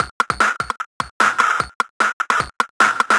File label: 150 Breaks Fx, Drum Loops; Zero-G; Soundclash Nu Dance Electro; Acidized Wavs; 150bpm; Breaks 4 Love